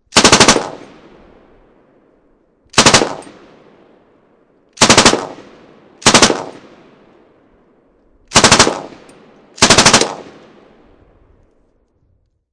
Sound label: M249 Auto Bridge, Machine Gun; Machine Guns; VRSonic